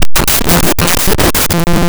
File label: Rhythm; 99Sounds; Mangling Audio; Data Mosh Loops; More Rhytmic